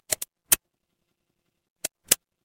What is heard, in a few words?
mechanical switch